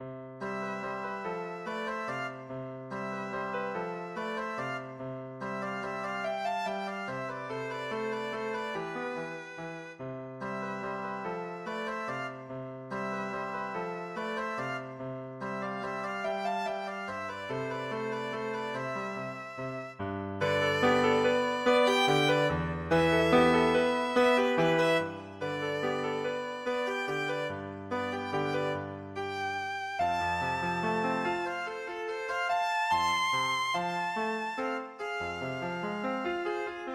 violin

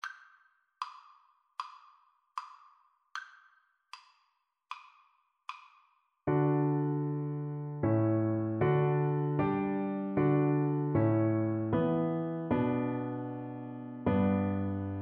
Es ist ein Ros, Cello

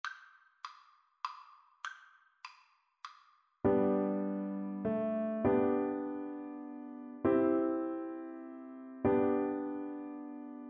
minuet, Percussion

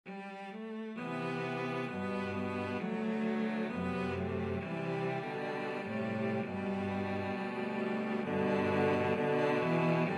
cello quartet

